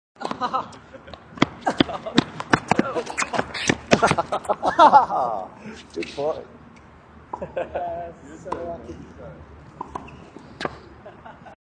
Player stumbles and falls while other player laughs.; Stumble Playing Tennis; Sports